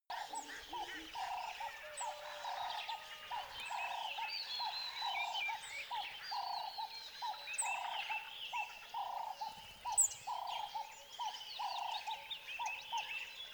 tropical river lively settle wildlife

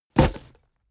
leather metal bodydrop

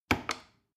plastic button small click heavy slow

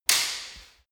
sword hit sword single

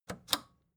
record player power click off slow

